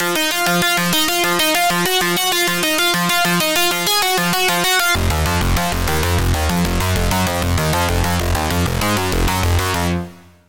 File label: Lead, Sylenth1; Routed Sound; Sylenth1 Expansion - Doom Carpenter Vol.1